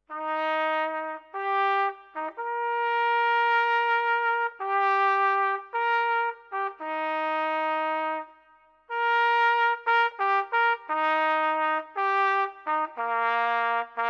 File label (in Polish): hejnał Dzierżoniowa; insygnia miasta